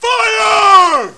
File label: fire